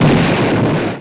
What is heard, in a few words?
Game, 游戏音效; 特酷声音素材库